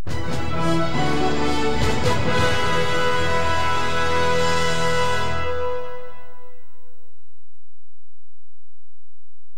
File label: BBC News Nine OClock Alt Close